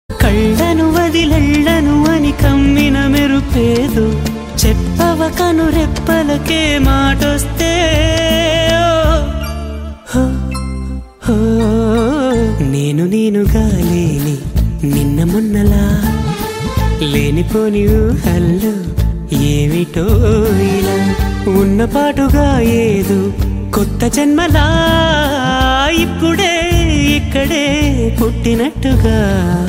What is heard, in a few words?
song telugu